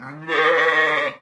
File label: sheep